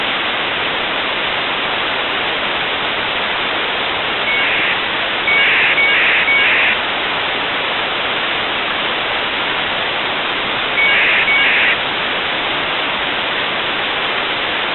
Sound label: c band burst